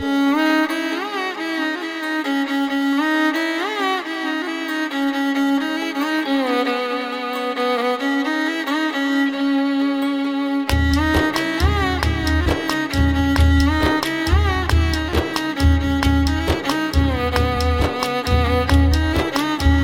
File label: folk Music, telugufolk